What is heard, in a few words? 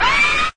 somen lower close; HeavenStudioPlus; rhythmSomen